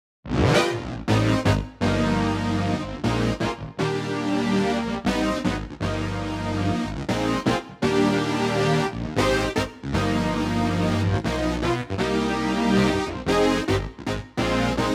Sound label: Poly Synth; DEXIBELL COMBO J7; organo digitale; Il DEXIBELL COMBO J7 è il primo organo digitale